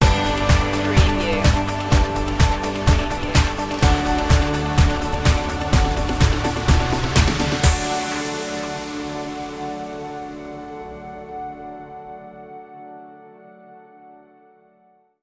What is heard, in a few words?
vacation road stinger